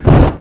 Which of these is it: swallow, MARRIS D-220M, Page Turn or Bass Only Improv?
swallow